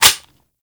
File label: Clip In, rifles; Snipetron